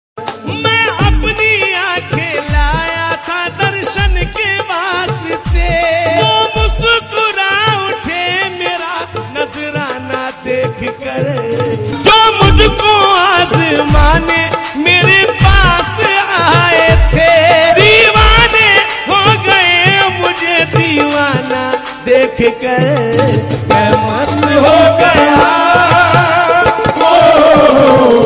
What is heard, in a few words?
qawwali devotional Islamic